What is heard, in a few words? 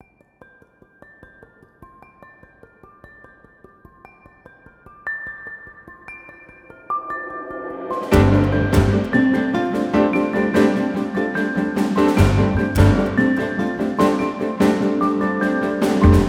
highkey 148bpm GMin; 40beats; dessertmtn head; chicory a colorful tale; ps4